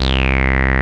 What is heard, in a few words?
BASS, Analogon; A-BASS 2